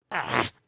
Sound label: Angry, emotes